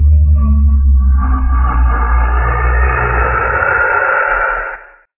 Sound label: Noise Monster